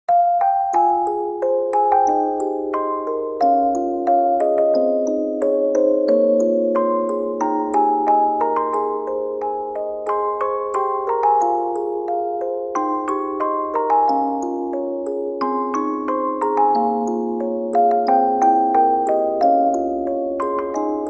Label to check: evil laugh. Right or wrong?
Wrong